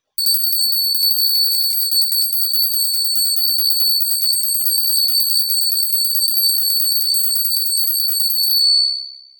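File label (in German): Friedensglocke Klang; Schoenberg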